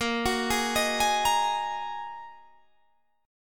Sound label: G sharp 7th Suspended 2nd A sharp